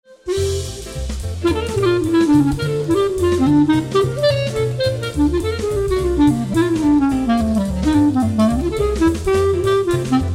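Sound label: Clarinet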